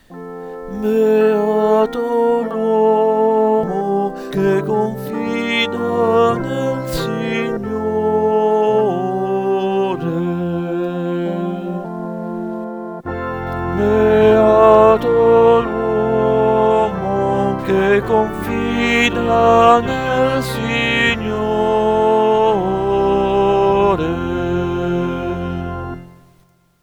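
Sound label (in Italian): Salmo tenor; Voci S + T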